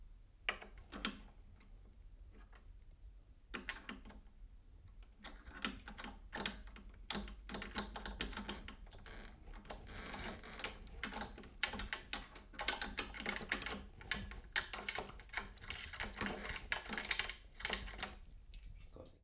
Keys In Wooden Door Trying To Open Door With Keys

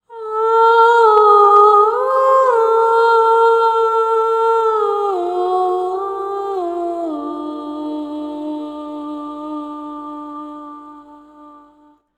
Woman Voice Chorus

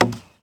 Wood Hit

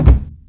Wooden Thud Mono

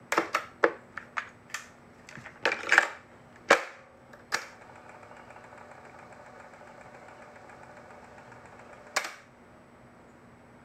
Cassette Tape Action

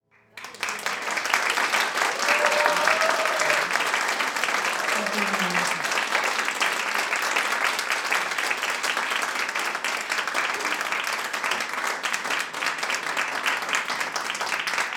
Applause

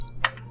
Electronic Door Opening

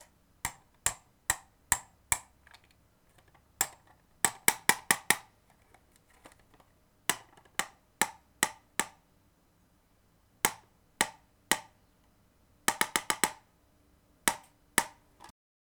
Hammering Nail

